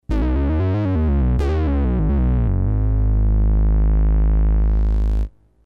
Sync Bass; Kris Rhen Presets

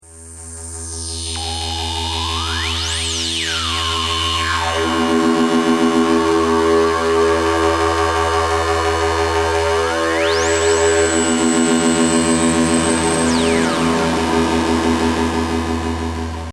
Scratch

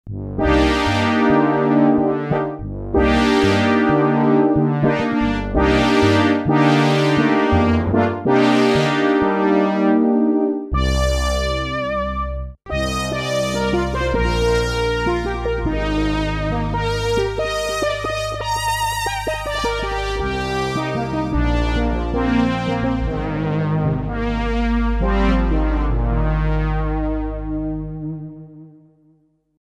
Poly Brass

